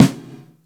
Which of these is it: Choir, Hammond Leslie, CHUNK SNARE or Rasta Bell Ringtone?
CHUNK SNARE